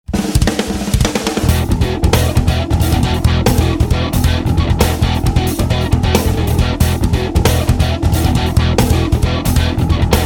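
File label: Alien Hip Hop, Drumset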